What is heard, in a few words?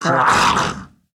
attack, zomb female; nmr